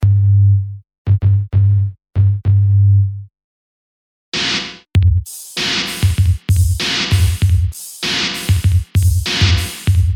Sin Wave

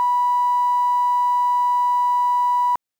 sinewave bounce

